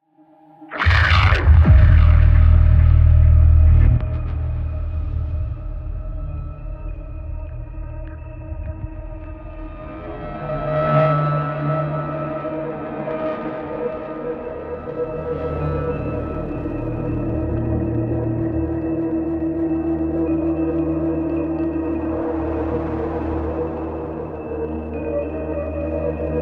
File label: Combat Suite Main Ambient; Super Gore Nest